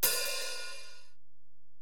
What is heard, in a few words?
HiHat, Alesis DM-PRO